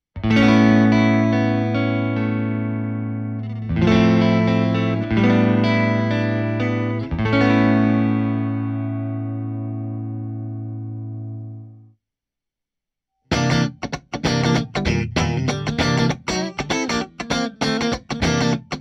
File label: tone fender funk